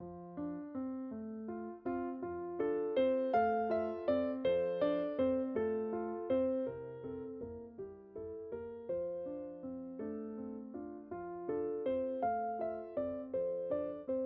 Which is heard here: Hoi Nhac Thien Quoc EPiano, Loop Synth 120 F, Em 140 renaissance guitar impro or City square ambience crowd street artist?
Hoi Nhac Thien Quoc EPiano